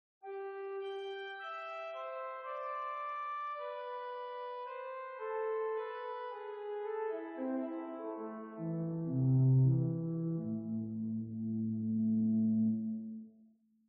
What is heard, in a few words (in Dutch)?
prestant 8